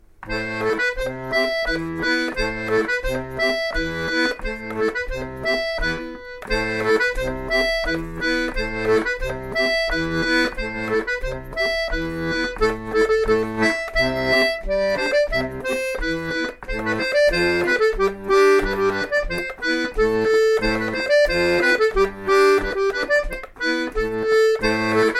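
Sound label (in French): hanter dro, accordéon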